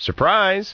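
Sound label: surprise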